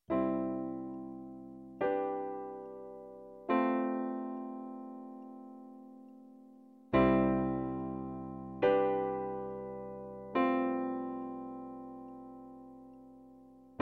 Acoustic; admiralbob77

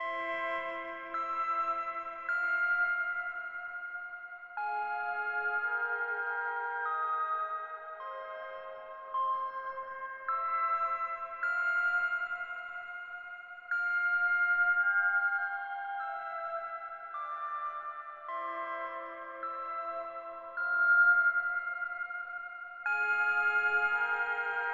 March of the Bells

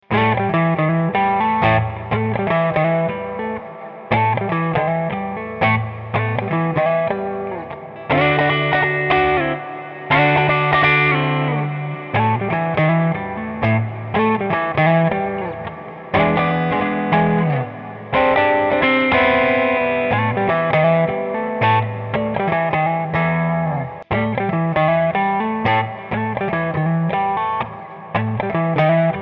A 120 R&B GTR Riff

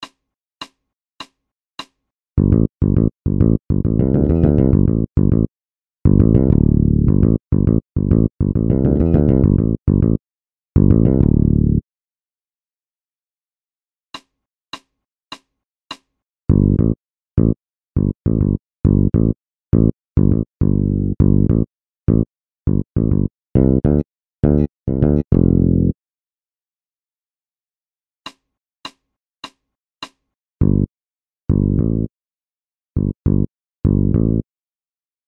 Bass And Drum Loops

